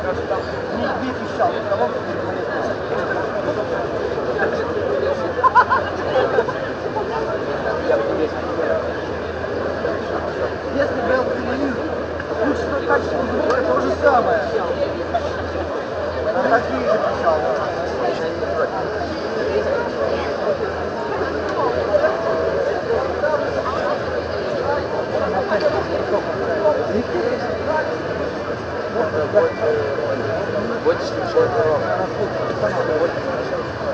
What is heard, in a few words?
babble, BUBBLE NOISE